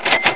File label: CASHREG